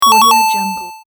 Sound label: 8bit pause